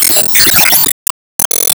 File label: Enemy Bigclam Active Hit; Disney Star Smash; Android, iOS, Mobile